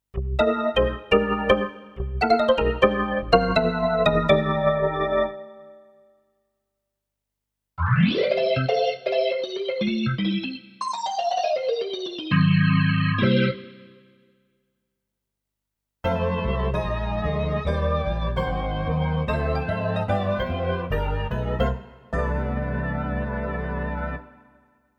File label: Organ; Thomann AK X1100